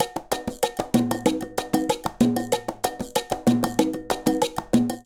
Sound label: Guaguanco 190bpm, Latin Percussion; Guaguanco 2; Ableton; Factory Packs